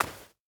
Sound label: stag footstep sand hard; Rust